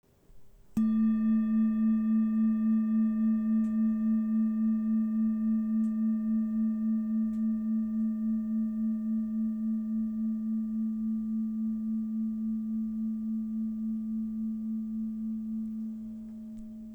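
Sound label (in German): Empfehlung, Klangschale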